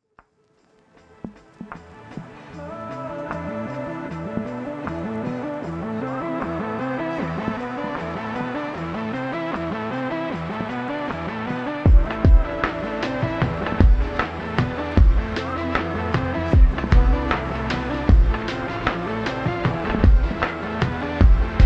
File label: Gaming Soundscape; Endel